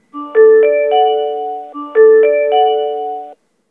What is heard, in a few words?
door open